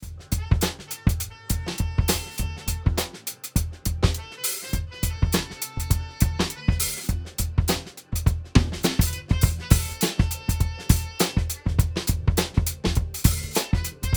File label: Ableton Drum Buss Transients Crunch Mix